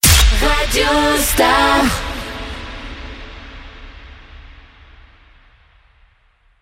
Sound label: ID SWEEP CHANT FEMALE, RADIO; COMBLAGES RADIO STAR; PLEIN COEUR